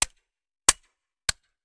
Musket Foley; Sound Ideas; Guns

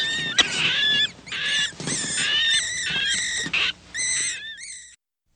Rats; Inquisitor 3

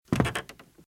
Footsteps - Wood - Creaky - Walk - Right

